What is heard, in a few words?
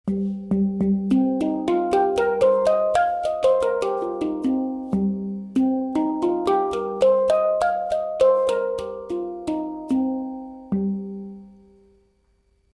Minor Penta, hang